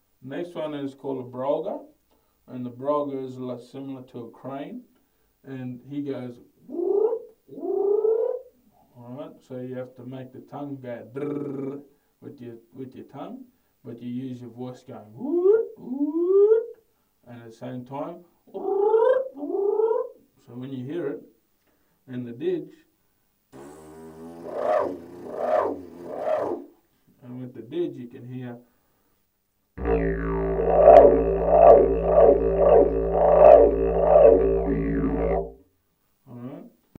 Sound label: Didgeridoo teach